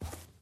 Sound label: footstep grass